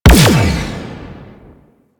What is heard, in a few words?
Capital Ship Turret Fire; Warframe; DE; Vehicles; RailJack; RailJackEnemyTurret